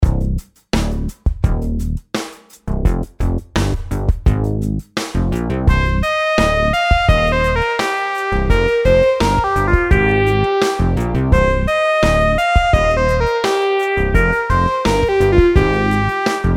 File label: rhythm simple 8s triplets